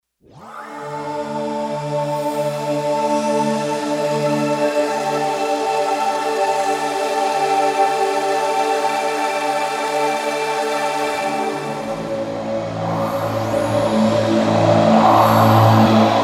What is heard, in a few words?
Bamboo Spread, synth